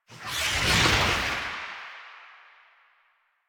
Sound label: Bullet Jump Whoosh, Motorcycle; Motorcycle Ninety Nine